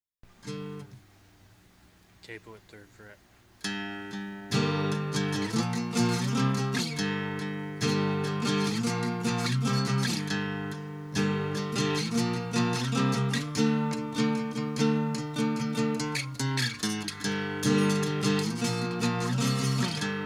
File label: Acoustic